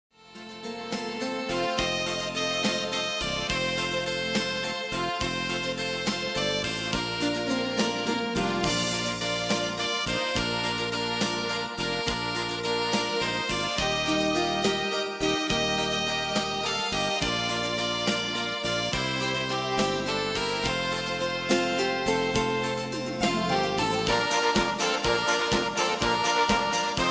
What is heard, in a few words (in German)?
Marsch-Walzer